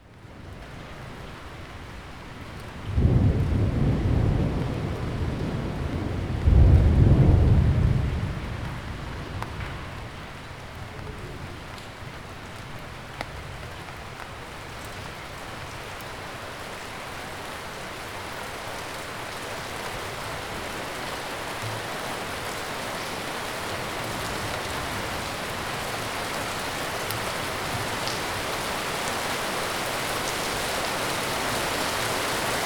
White Noise Sound Therapy

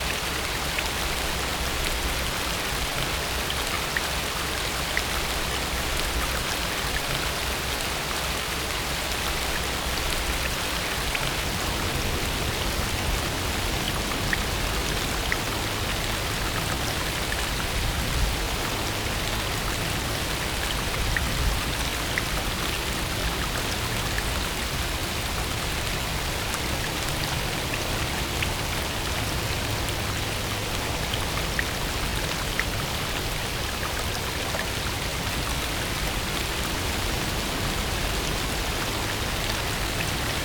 Gentle Rain

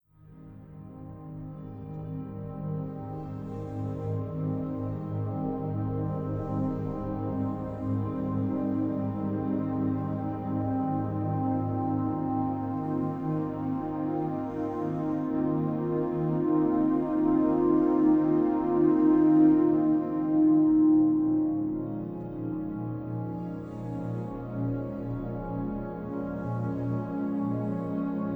Shakuhachi; Reiki

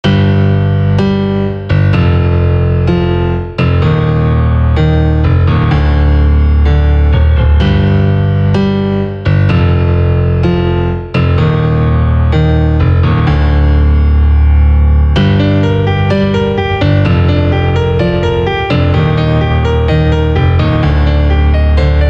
Mini instrumental

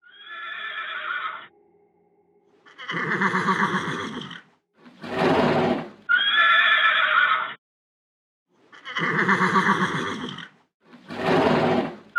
Animal ASMR

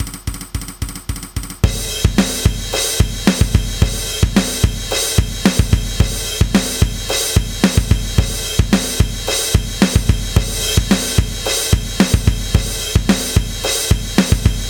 drum version; Vojna za prestol

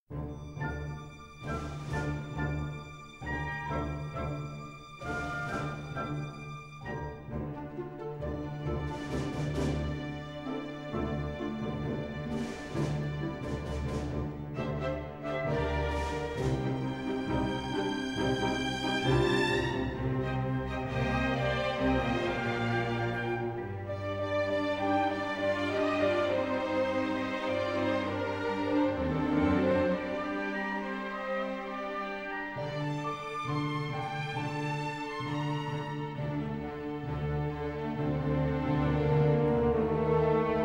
Symphony Orchestra; Jeopardy

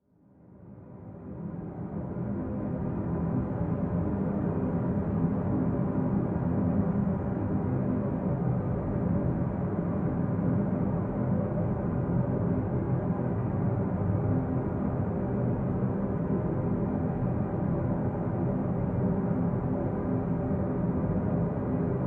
Relaxing Rain Sounds

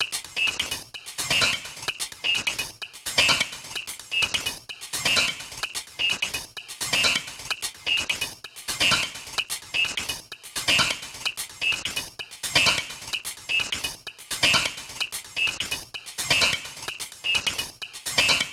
Techno Perc 128

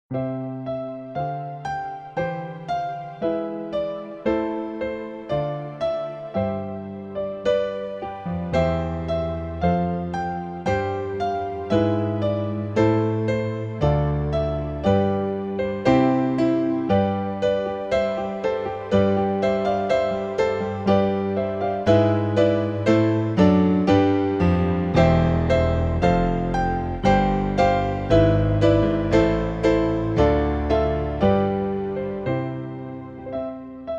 Piano, Ode to Joy

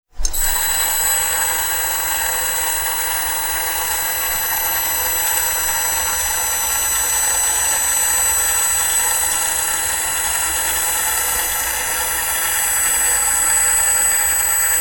Mars Rover Arm Retract Motor; Sound Ideas

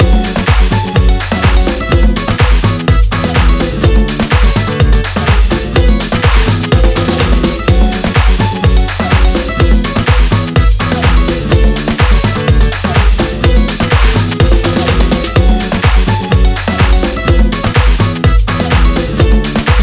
Flat guitar

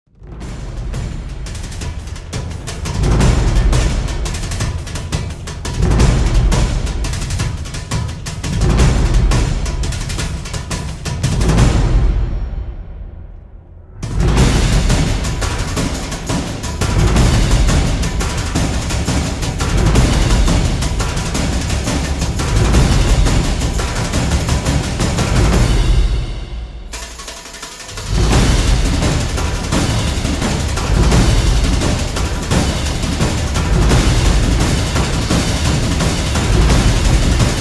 Drums

